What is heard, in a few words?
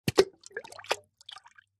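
Splash Small Plop Plastic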